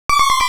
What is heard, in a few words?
Cry; Pokemon Solar Light & Lunar Dark 1.0.1; Cries